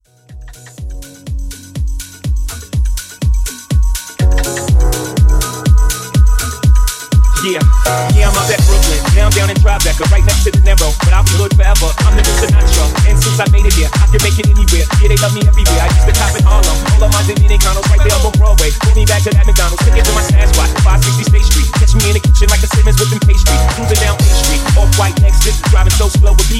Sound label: Club Rework; Remixfreakz